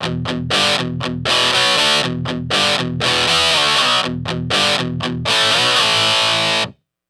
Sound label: Guitar G